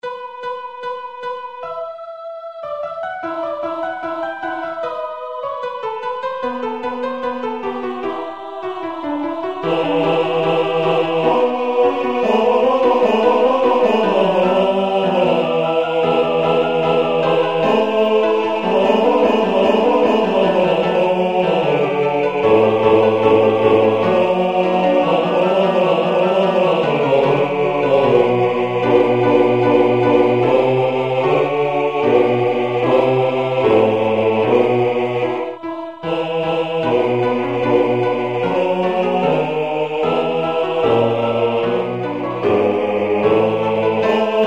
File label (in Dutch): Bas, koor; A.Vivaldi; Propter magnam